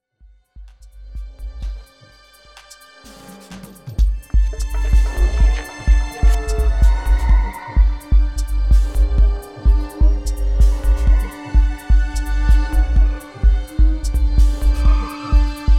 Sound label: electronic tachycardie tribale tribal heartbeat